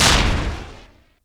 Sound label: gun, gun shots; AKAI S-Series CD-ROM Sound Library VOL-6